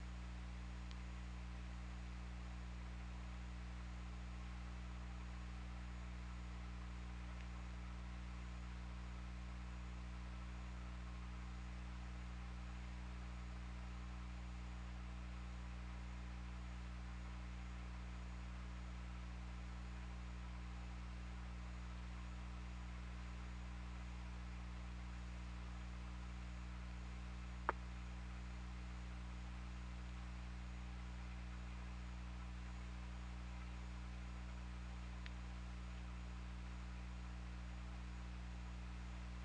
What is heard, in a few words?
Orca; OrcaLab